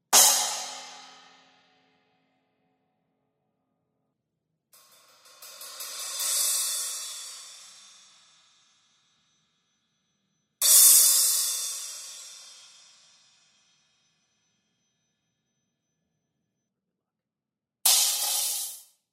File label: Pre Configured Stack; cymbal